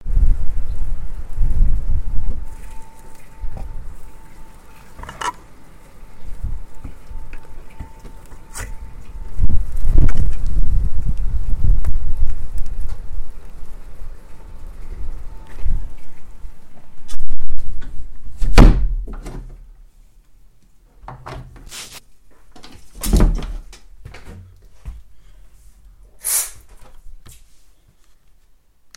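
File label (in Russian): вода шумный низкий близкий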